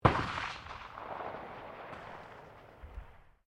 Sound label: MUSKET FLINTLOCK 50 CAL AMERICAN FRONTIER REPRO FIRING DISTANT